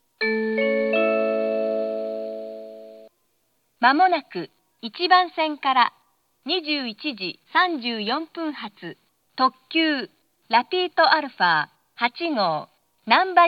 limited express; rapito-alpha8; nankai-electric-railway; kansai-airport; namba

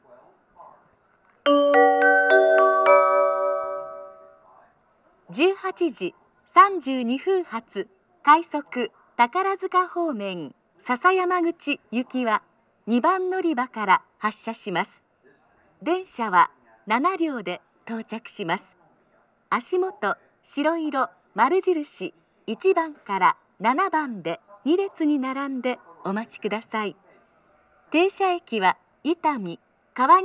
rapid, jr-west amagasaki station; sasayamaguchi

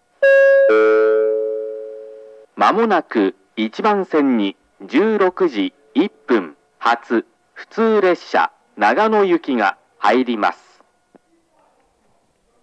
hirooka local, station; jr-east; nagano

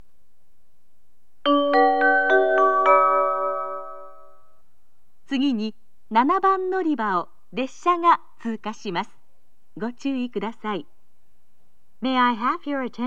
pass, kyoto station

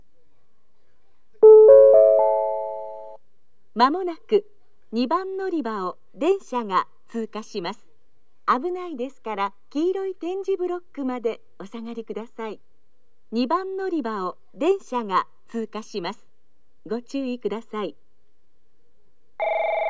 pass, station; jr-west; suita

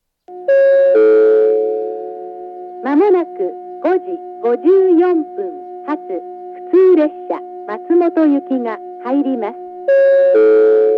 up local; jr-east; azumi oiwake; matsumoto

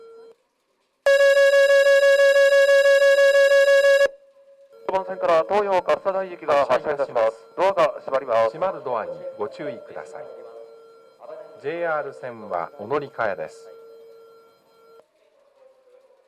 nishi funabashi, station

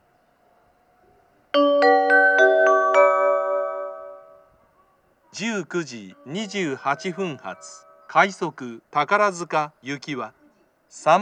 rapid takarazuka, amagasaki; station; jr-west

